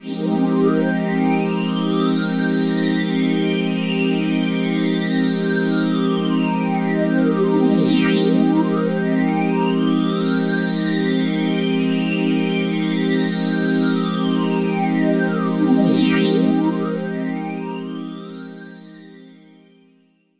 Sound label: Pad C2, club techno